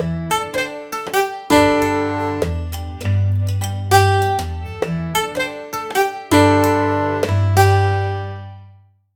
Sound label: subtle string instrument; beat